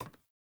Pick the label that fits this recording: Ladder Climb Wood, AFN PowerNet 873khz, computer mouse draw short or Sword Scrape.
Ladder Climb Wood